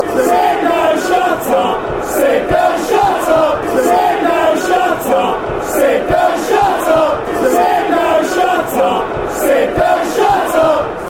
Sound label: sit down shut orient